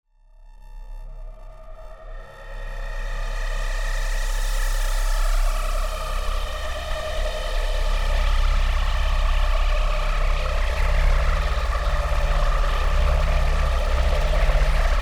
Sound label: Distortion